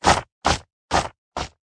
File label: floor